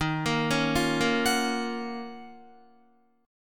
D sharp Minor 9th, Guitar; arpeggiated

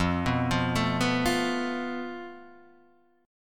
F Major Flat 5th, Guitar, DADGAD

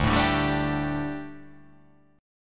D Suspended 4th D

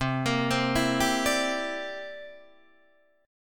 C 9th C down Guitar Open D

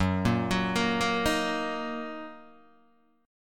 Fsharp 6th down Guitar Open D

